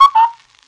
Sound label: cuckoo